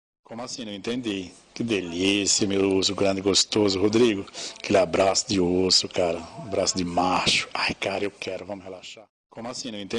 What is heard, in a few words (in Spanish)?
jaja